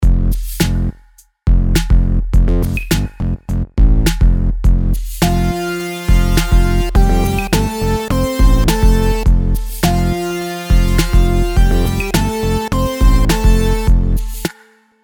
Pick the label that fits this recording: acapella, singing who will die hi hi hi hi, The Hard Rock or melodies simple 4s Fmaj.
melodies simple 4s Fmaj